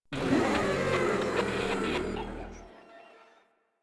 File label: Death; Proto Infested; Warframe; Infested Ninety Nine